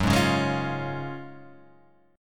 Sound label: F sharp Major 7th Suspended 2nd, F sharp, down